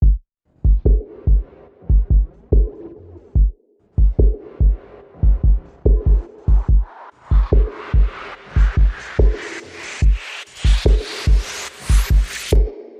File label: Percussion Bass and Effects